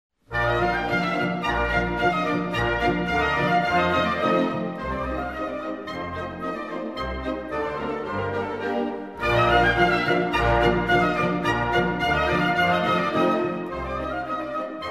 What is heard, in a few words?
Polka in B Flat Major; Dmitry Yablonsky; Dvorák